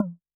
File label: Electro Kit Tom